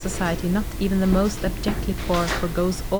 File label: noisy